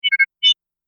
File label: Sci-fi user interface